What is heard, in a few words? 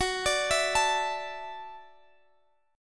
D Suspended 2nd F sharp